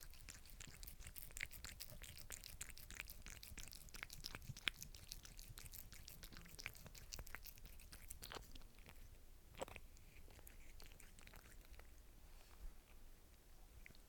Cat Eating

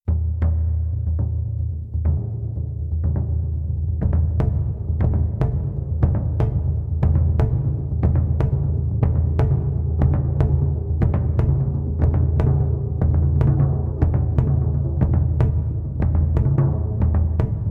Tribal drums

